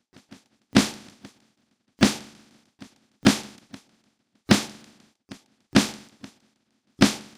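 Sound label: drum, separated component 3; Separation of Sound Sources by Convolutive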